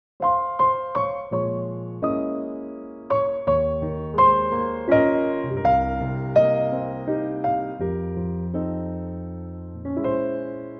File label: piano; key - Ab - vocal range - Eb to Eb; Lovely old waltz in a 2016 Pianotrak arrangement